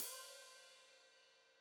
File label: Hat Foot Open, Hi-Hat; Deeply Sampled Drums